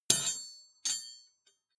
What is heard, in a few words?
metal impact bar rattle; industrie-wirtschaft